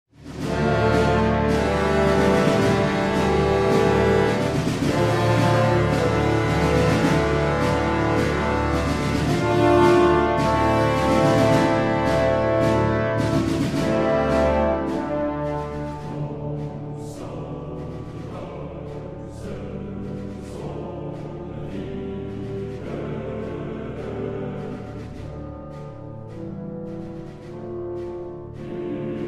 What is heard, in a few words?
Drumsound Rising